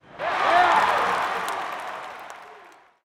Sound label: yay